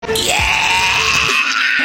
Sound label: yeaaaaa; gmod